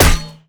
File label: Axe Metal, Damage